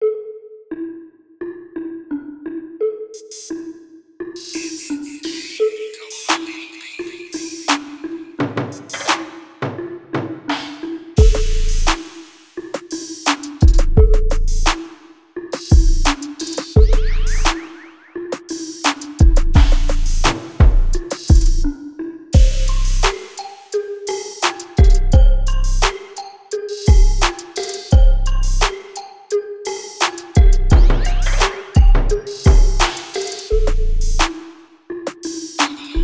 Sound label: drum bundle, drum midi